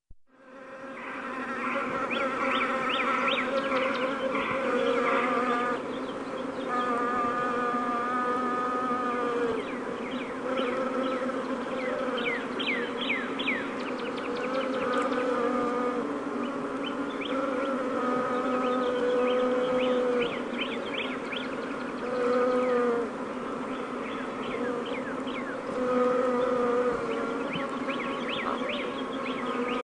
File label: Honey Bee